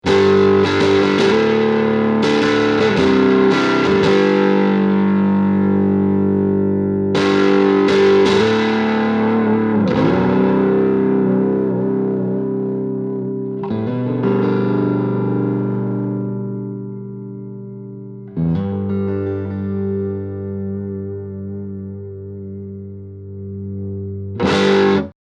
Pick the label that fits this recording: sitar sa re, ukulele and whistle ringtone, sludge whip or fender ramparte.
fender ramparte